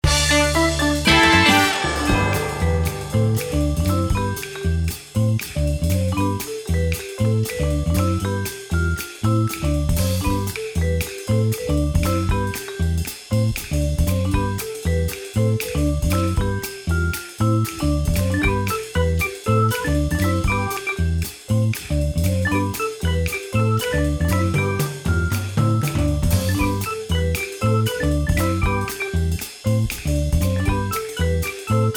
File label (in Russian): muz na ozhidanie